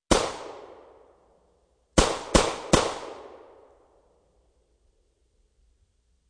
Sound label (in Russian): Pistolet 38 kal vystrel na ulice